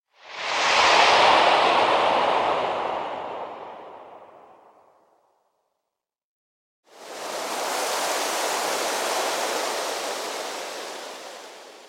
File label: Wind - Whoosh by x5; Hollywood Edge Sound Designers Tool Kit